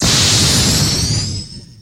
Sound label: Underwater Laser FX